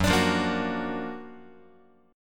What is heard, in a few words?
Gb Augmented Major 7th Gb down Guitar 4A,4E,5A,5D,5G sharp,6C sharp; Gb+M7 strummed; JGuitar